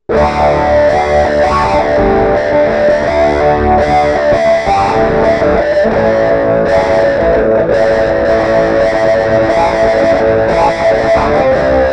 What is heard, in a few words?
CHO WAH